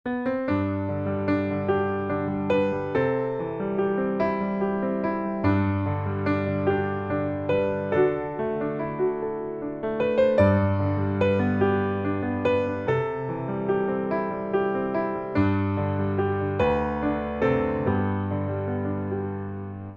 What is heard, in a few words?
piano